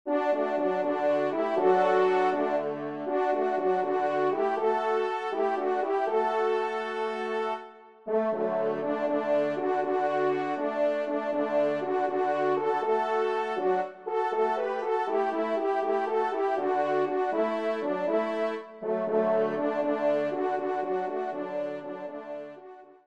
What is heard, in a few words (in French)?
Marche Triomphale, trompe; Marche Triomphale des Louvetiers; Auteurs : MM. de Beauvais de Saint-Paul; Château de Saint-Paul